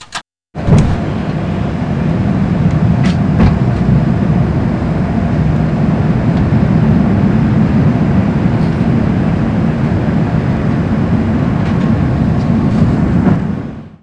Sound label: gear, 737-800